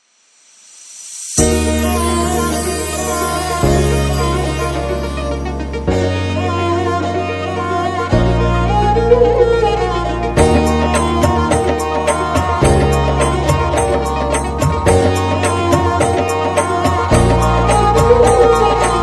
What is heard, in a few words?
Rajasthani Folk